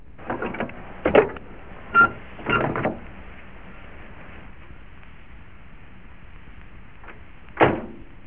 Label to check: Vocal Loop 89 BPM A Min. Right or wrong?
Wrong